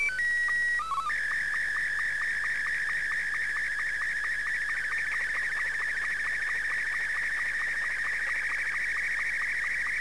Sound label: sstv